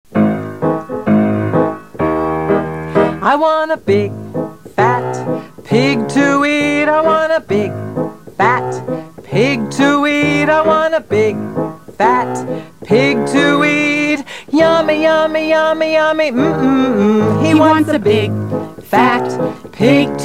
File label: Wolfs Song Vocal